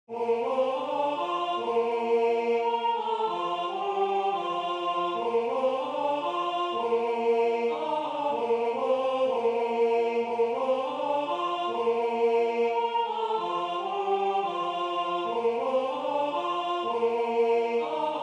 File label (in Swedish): SOPRAN